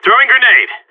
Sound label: grenades; covert; cstrike